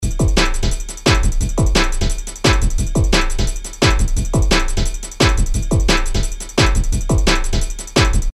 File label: Resonators